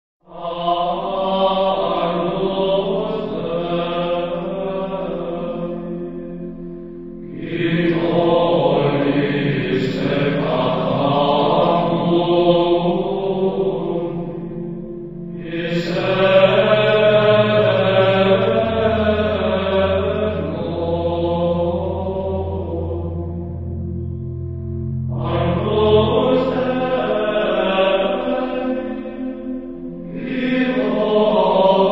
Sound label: Agnus Dei De Angelis, hymns; VIII